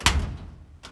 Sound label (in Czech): dvere; Mafie 1